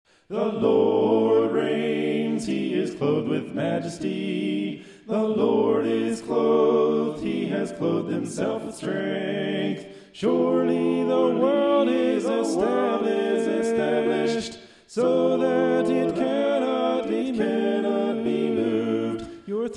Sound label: a capella scripture song; psalm 93